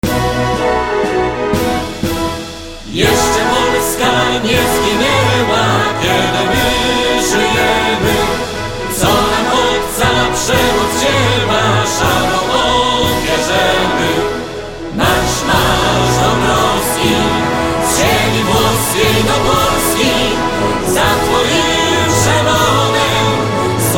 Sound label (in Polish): Hymn Polski